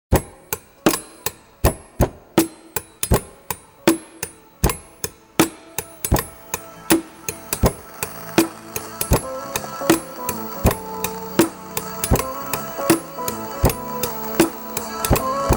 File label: light jazz with forks; bubbles